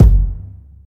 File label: long room reverb bass drum single shot f sharp key